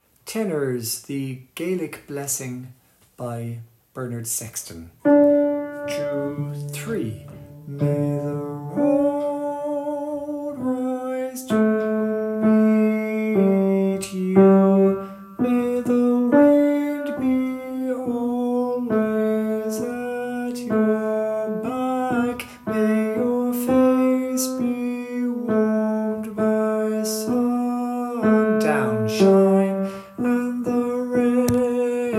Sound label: tenor